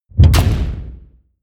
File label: slam, tueren